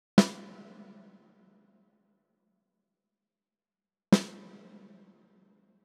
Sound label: Sn plate